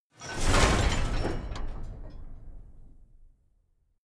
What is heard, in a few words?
metal chain stack drop wide